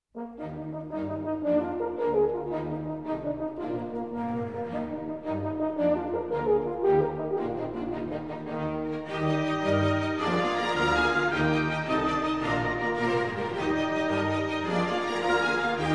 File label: Horn Concerto; Ren & Stimpy